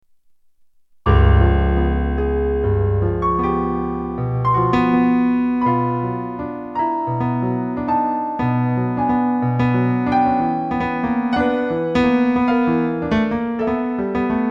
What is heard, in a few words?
Piano; Marche Ds Les Allees Du Parc; Romances Sans Paroles